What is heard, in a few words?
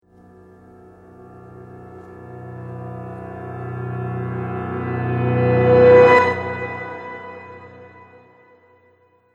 Riser Reverse Piano; Rise And Dive Sound Effects